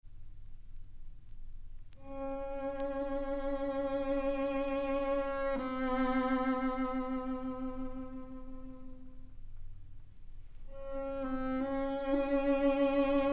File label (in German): Sonate fuer Viola, 2. Satz